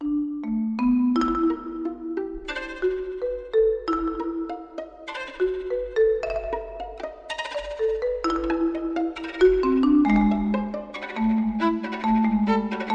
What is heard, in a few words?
duo laughing; luedeke; ah matsushima